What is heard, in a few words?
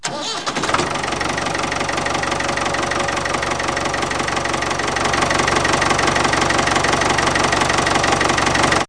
Tractor Diesel; BOOM! - Wild and Wacky Sound Software; City Life; Trucks & Tractors